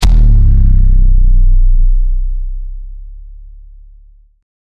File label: deep boom with heavy bass